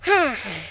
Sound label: snoring; yoshi; sm64coopdx; char select extra chars